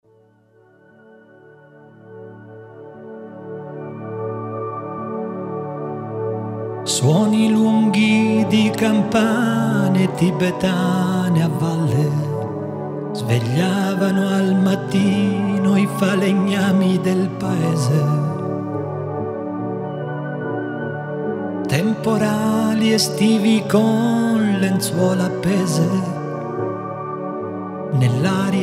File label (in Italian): campane tibetane